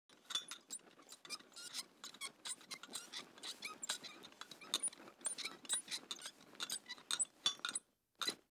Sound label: Golf Bag Rolling; Fairlight Sound Lib